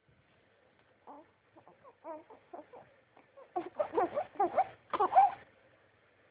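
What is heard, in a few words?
baby hungry, female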